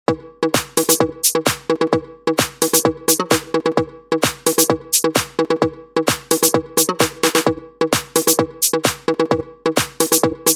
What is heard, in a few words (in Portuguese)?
eletrofunk; cd equipe revoada especial fim de ano